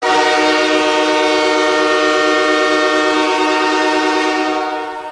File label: playoff goal horn; los angeles kings